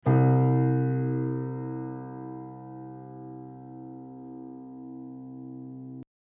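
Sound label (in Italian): Do1 orig, Ascolta il martello originale DO 1, al quarto livello di sollecitazione meccanica (f)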